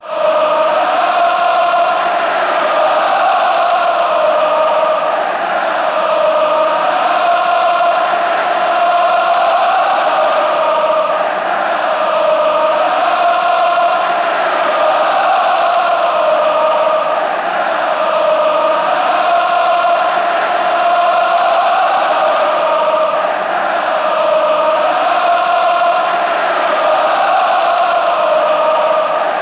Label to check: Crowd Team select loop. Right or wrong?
Right